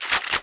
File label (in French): arme pompe; TheDanes UT Projects; AMLPheadremoverV2